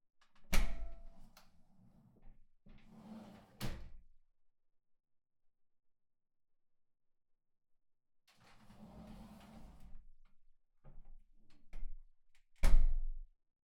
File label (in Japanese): お風呂のドア開閉; KU100; お風呂に関する音